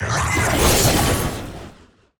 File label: Enemy Attack Spin Cast; bloom minigame